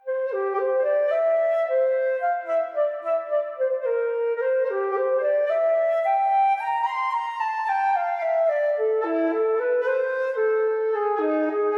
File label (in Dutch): Fluit; Toki Tori 2